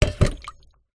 household water in metal pan shallow down on surface